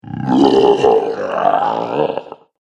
Alien Ogre; Alien Sound Effects

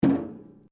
RUBBER ON METAL HOLLOW; Materials

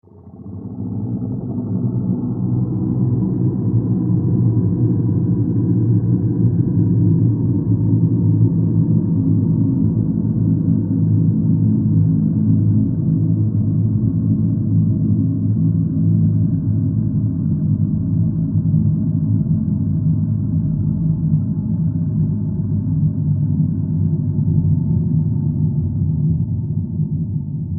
Horror Drone Low Growl Moan; Evil

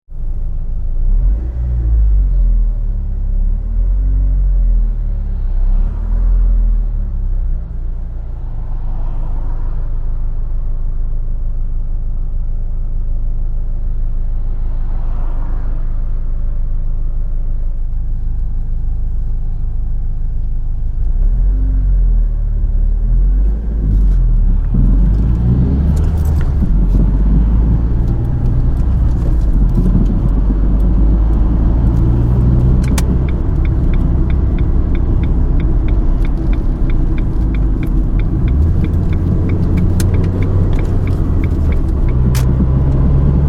Recording of interior of car while travelling; Car Journey; Cars; Vehicles

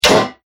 War Hammer Scuffs Armor; Impact Sound Effects; combat

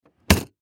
Toolbox Close Slam Plastic; Hand Tool; Industry; Hand Tools; Sound Effects:Tools; Blastwave

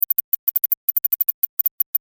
Science Fiction Teletype Readout Beeps High Pitch; Communications

